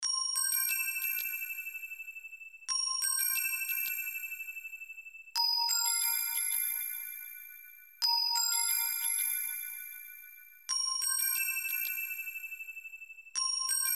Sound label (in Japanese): mezame soprano